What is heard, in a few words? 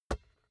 basketball catch finger tips hard